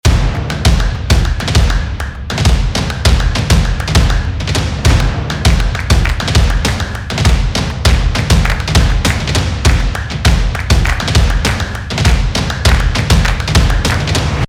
epic drums stomps and claps loop

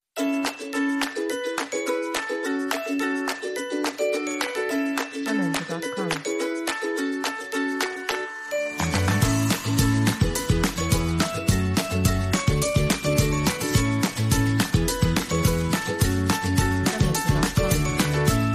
Happy Fun Ukulele; BeardMusicStock

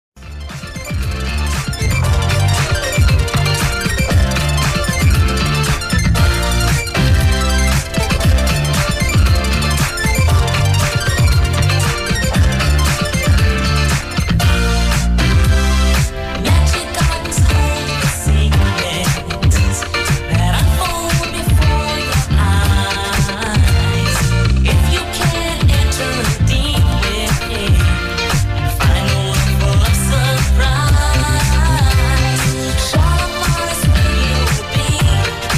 FUNK

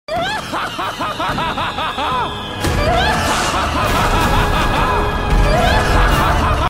Laughing; Dr Zomboss

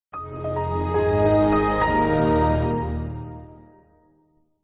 Microsoft Windows XP sonido de inicio

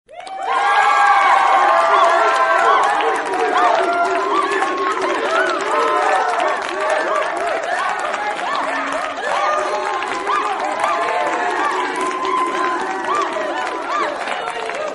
Excited interior crowd cheers and whoops

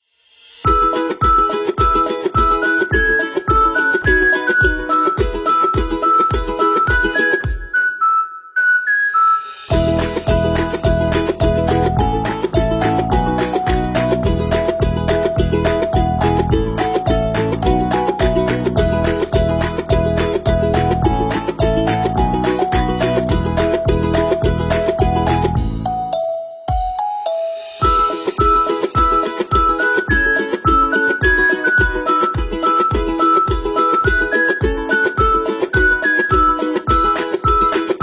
Upbeat Ukulele

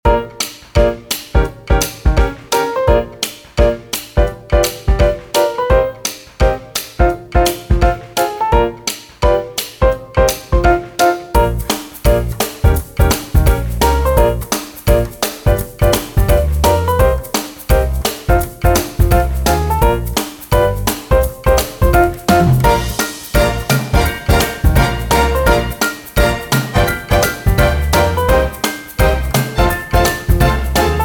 jazz happy

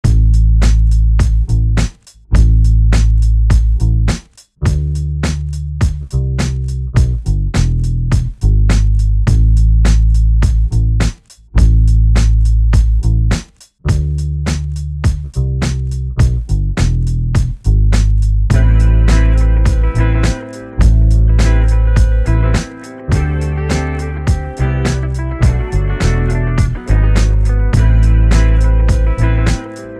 Instrumental

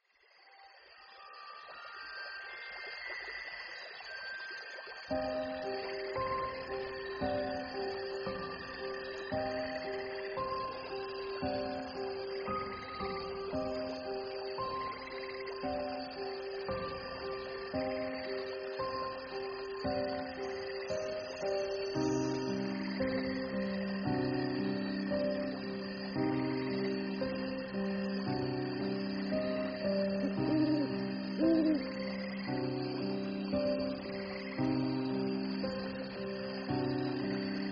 Enchanted Forest Music Ambience

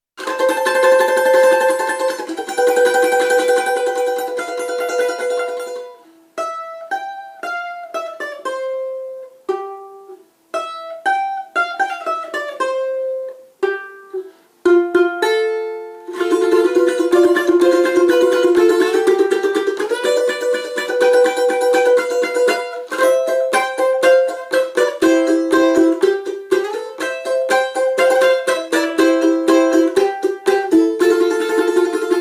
Balalaika

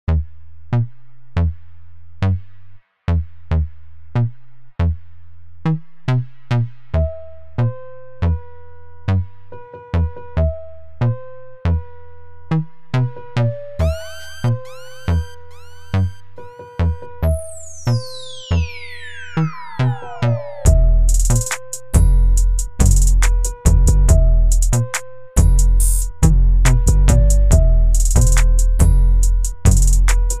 Type Beat

